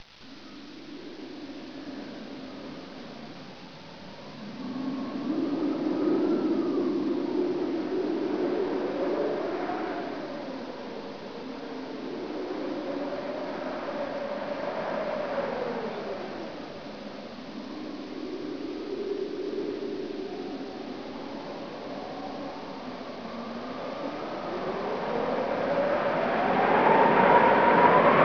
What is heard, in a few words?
Wind; 特酷声音素材库; 自然; 风声